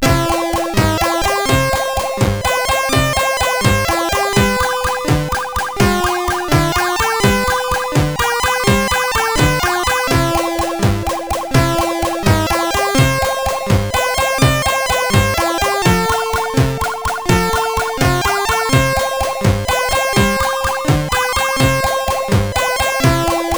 sid CSG8580R5; Fiendish Freddys Big Top O Fun

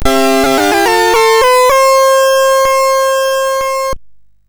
sid MOS6581R2; Fiendish Freddys Big Top O Fun

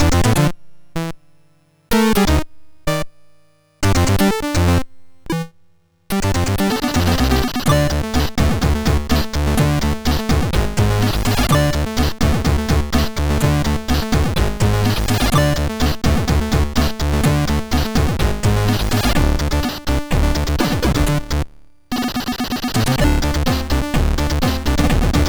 sid MOS6581R4

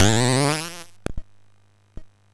Aurum, MOS6581R4; sid

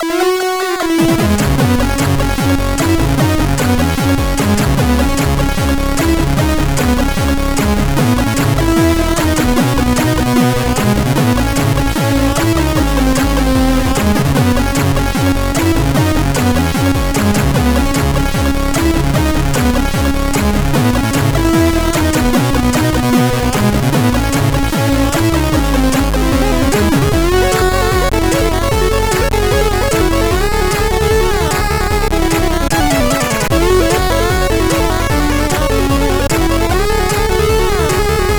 One Ascender, CSG8580R5; sid

